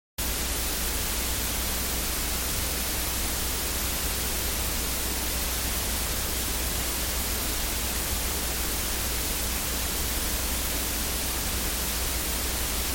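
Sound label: noise of a compact cassette tape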